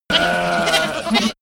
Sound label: goat baaing goats